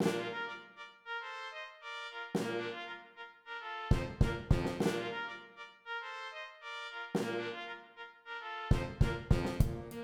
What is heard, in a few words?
B perc; Borgertog2019